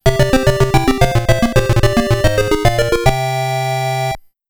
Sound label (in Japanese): ステージクリア